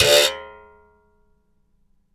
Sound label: BIRIM COIN, BRAZIL